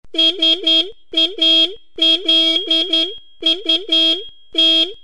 klaxon, moto r1150gs

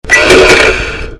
initialitation abs, moto r1150gs

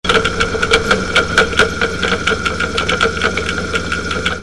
boite, moto r1150gs

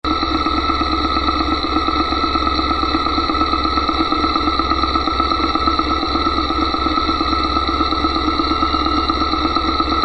tendeur d, moto r1150gs

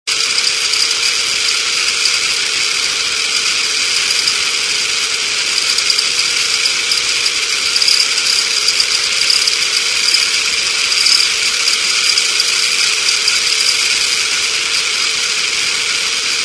cliquetis filtre, moto r1150gs

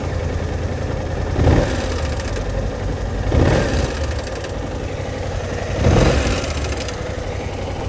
bruit de chaine, moto c650gt

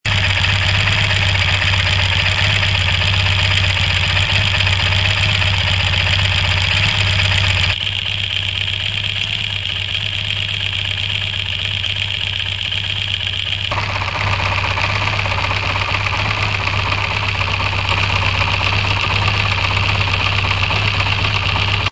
distribution usee, moto tdm

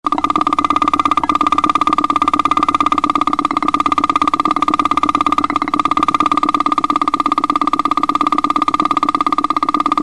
injecteur g, moto r1150gs

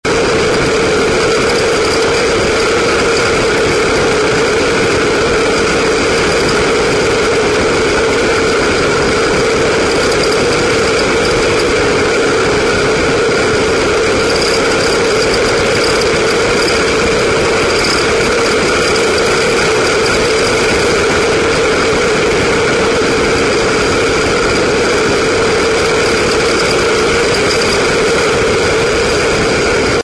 cliquetis tout, moto r1150gs

